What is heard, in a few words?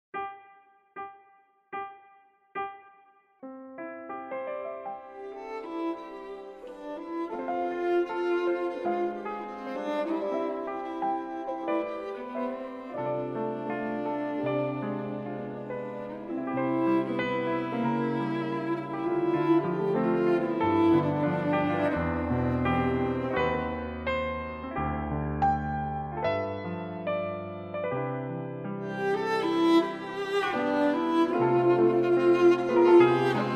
C play referencia cello